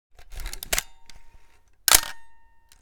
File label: Analog camera shutter click long exposure